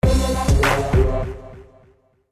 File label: Job Fail